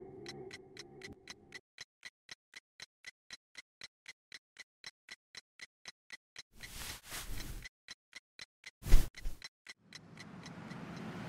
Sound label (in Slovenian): Tiktakanje